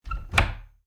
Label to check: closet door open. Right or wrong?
Right